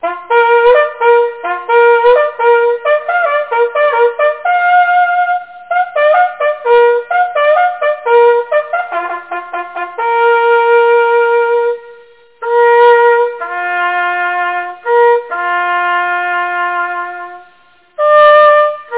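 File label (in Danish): agerhønen, jagthorn; agerhoenen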